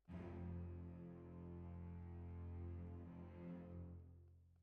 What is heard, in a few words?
Bassoon, Jazz for piano